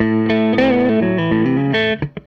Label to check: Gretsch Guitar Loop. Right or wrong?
Right